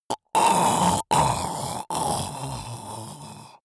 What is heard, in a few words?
monster choking zombie dying; comedy-cartoon; soundpool